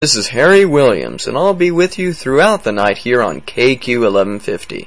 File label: Good Old Blues